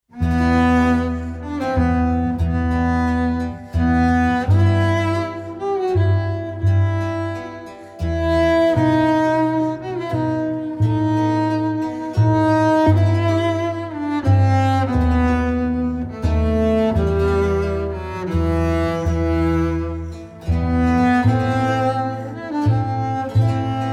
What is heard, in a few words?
Sonata; A Vivaldi; Satz3